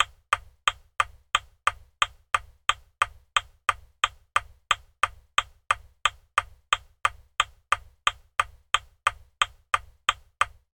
van indicator click mercedes sprinter; transportation; sound effects; sound effects one

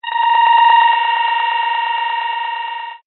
science fiction radio signal interference burst

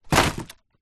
wood pieces thin plywood set down on ground

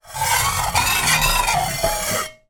pan scrape on stove hob metal on metal

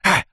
human male short fight vocalisation

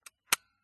Smena Symbol camera wind lever locked; dpa4060; PMSFX

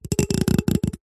comb metal fingers run through teeth; zapsplat

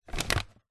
pet mouse rodent food bag pick up; animals

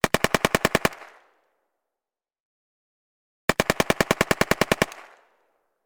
gun carl gustav m45 swedish k; still north media

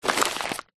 mail bills paper small pile pick up; foley; zapsplat

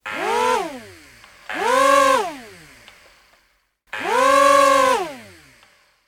toys drone or rc plane motors 3x short bursts

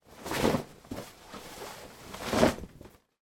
foley clothes trousers turn inside out

